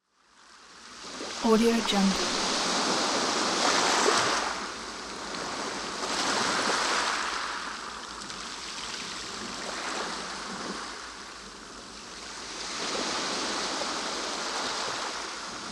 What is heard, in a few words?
tranquil ocean waves